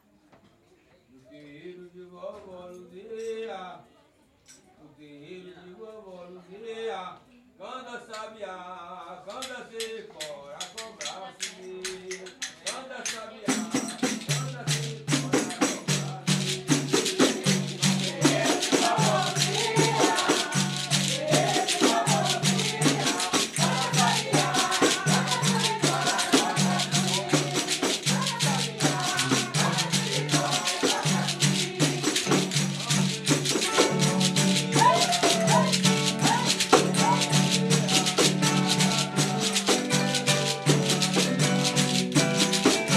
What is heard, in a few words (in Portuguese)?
fanti baiao de princesas; no terreiro